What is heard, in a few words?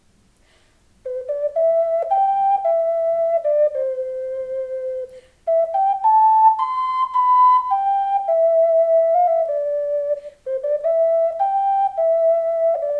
ocarina